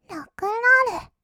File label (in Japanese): ロックンロール; 1200 kawaii voice!!